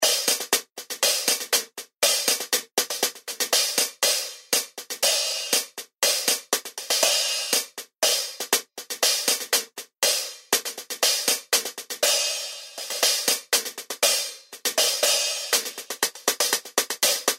Zildjian K Custom 14 Inch Thin Crash Hi Hat Loops

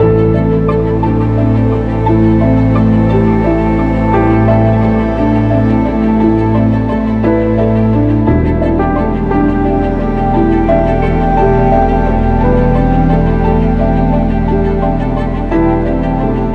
Film Score

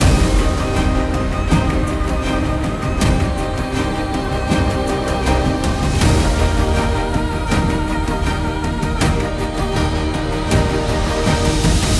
Library Dramatic; Audio Animals

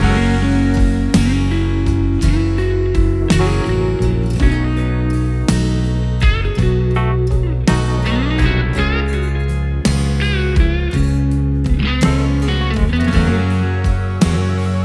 Country

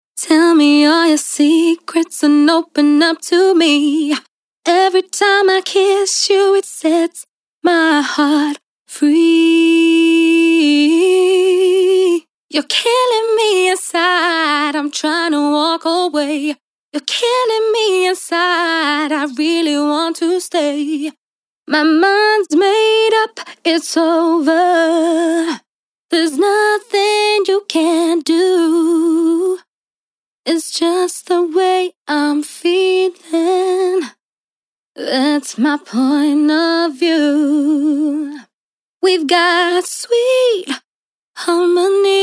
Vocal Hooks 110 BPM Vocal Hooks

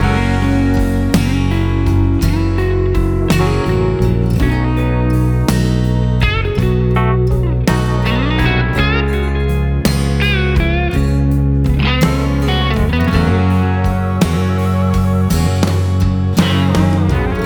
Country Original

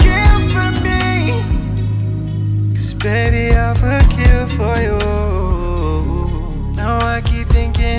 RnB; Dolby

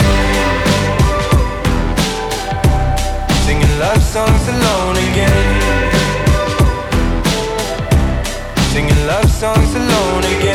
Pop

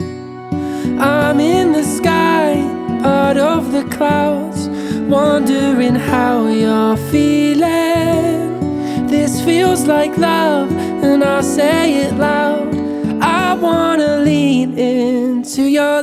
Acoustic

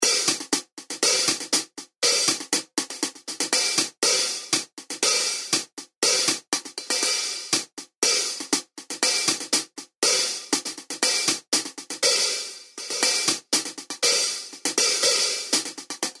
Zildjian K Custom 17 Inch Dark Crash Hi Hat Loops

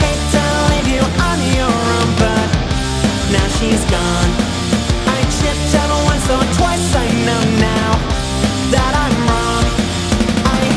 Pop Punk

